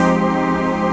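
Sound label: trance pad; Best Service; XX Large - Pads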